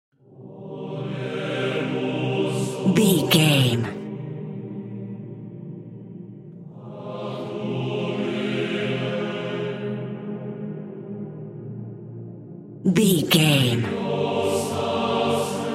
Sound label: emotional choir